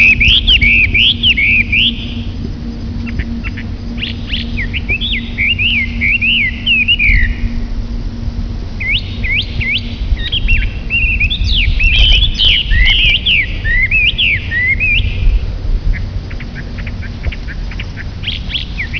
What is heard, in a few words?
Hwamei